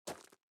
SFX run gravel; out of reach treasure royale 2020 gamerip pc

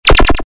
hack; Robot Wants Fishy

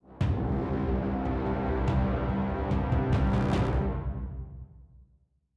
Start round; out of reach treasure royale 2020 gamerip pc

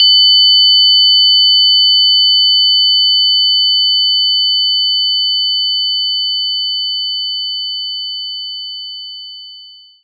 overtones

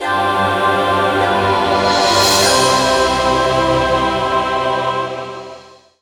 Blessing! Jingle; Drihoo

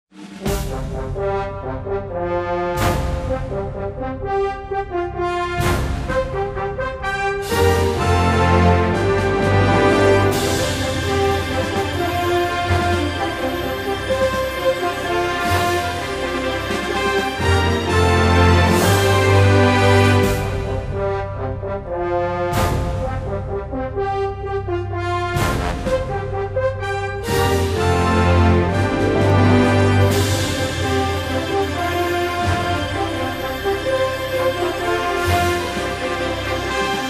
Victory; USA; World War III: Black Gold

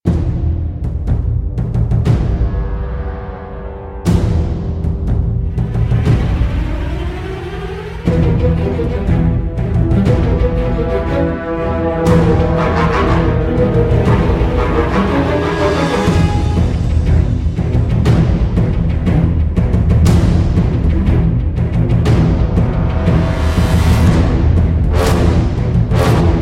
Music Gameplay Lagoon

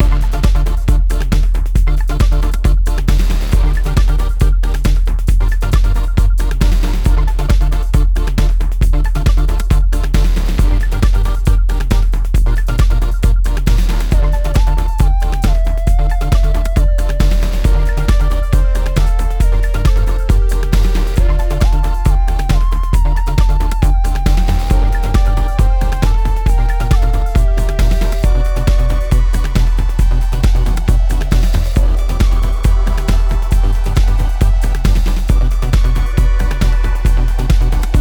Panic Loop; pang adventures

